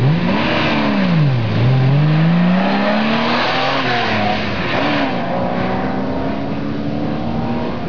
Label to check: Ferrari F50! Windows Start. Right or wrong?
Right